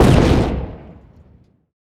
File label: ship hit small effect; Hearts of Iron IV Death or Dishonor